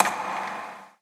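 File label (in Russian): звук барабана том смонтированный из полевой записи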